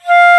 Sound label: Flute p F3; Digital Sound Factory Virtuoso